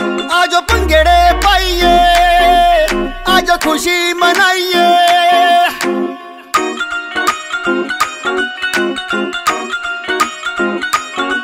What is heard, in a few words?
Punjabi Song Ringtone